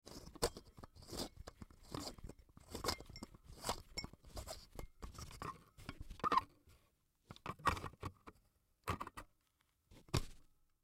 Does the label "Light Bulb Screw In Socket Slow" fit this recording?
Yes